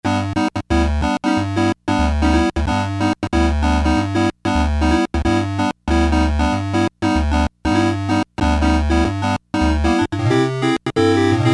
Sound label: Synth 1 - AnaComp, Crumar Trilogy